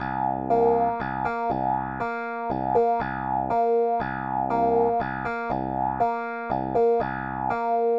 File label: clave 2nd line; Clave Second line 2+3 Avec main gauche; Funk Piano